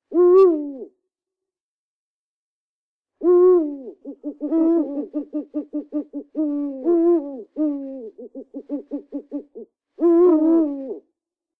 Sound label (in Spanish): Buho sonido